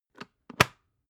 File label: water boiler close top